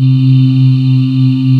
VOICES C3, Choir; USB Soundscan vol.28 - Choir Acoustic & Synth; AKAI

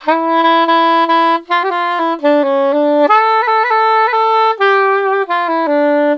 SAX, Balkanish Traditions

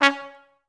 TRS2STAC C3, Advanced Orchestra; Best Service

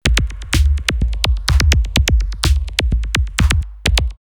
Rave drumloops 126; X-Static Goldmine; 90 s Sample CDs

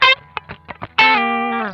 Funk Guitar; Zero G - Funk Guitar

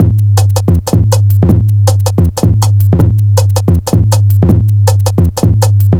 160, Drum Loops; Total Drum&Bass

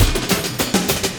loop, Drum & Bass, 100 BPM; Ueberschall; Drum & Bass Resonance

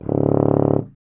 BRS TUBA, Solo Instruments

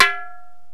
TAMBO; Crypton - DrumBase5000; GAMLEAN+INDI

